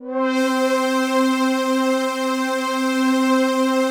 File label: PAD, Pads; Best Service; XX Large